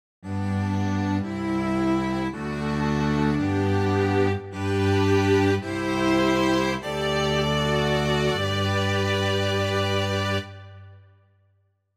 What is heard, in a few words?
yamaha tyros5 string quartet